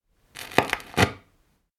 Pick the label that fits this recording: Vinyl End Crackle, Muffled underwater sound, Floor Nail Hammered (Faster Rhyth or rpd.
Vinyl End Crackle